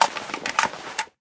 rifle clip in; Left 4 Dead; Source Engine

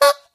taunt bumper car horn; video games; source engine; tf

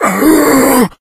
death, infected male; left4dead; Source engine

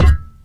physics cube impact soft

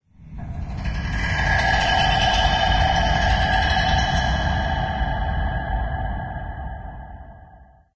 amb click stick, ambient organic; video games; source engine; portal2

